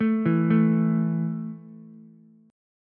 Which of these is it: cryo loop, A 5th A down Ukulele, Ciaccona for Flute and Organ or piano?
A 5th A down Ukulele